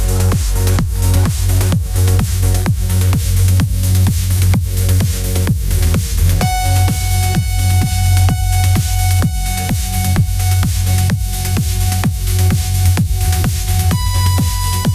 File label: electronic dance music synthesizer lead